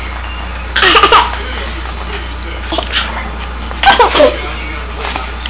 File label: Laughing